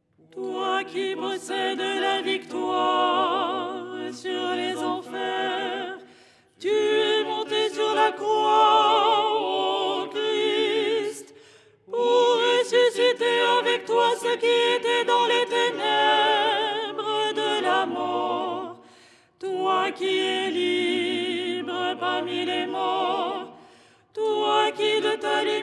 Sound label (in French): soprano; Au lucernaire, 1ère stichère du dimanche; Les 8 tons en français; ton 6